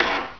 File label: garage, button; hl2; doors